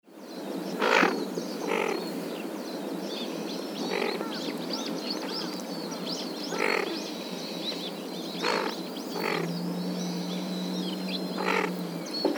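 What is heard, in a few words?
kinkuro, Tufted Duck; koe; nishinomiya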